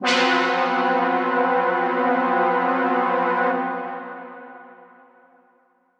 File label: pad suspense F